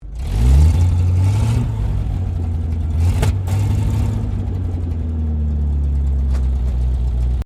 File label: APC FV603 Saracen Ignition, British Saracen Light armoured vehicle; Trucks; Vehicles; SoundEffects-Foundation1